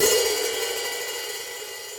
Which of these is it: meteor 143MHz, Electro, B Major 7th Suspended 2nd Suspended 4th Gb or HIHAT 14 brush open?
HIHAT 14 brush open